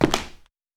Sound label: Light Sneaker